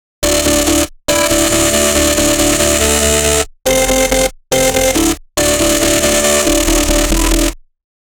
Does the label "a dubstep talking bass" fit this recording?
Yes